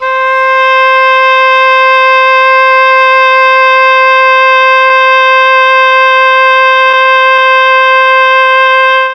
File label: C3 OBOE, mellotron; CHMBLN OBOE